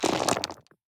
DICE on Neoprene, Throw and Roll, Standard, 16 Sixteen Dice; Samples; ArticulatedSounds; DICE Sfx Party (Extended)